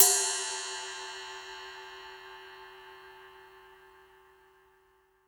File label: RIDE, Drum Dry; East Collexion; Drum 1 Dry